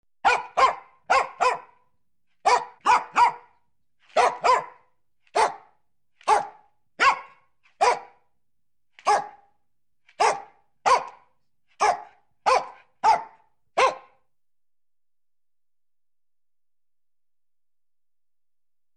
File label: Small dog barking loud